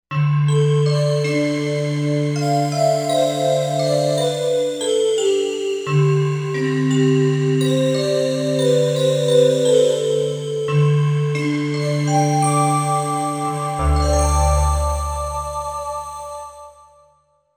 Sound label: Solar Bells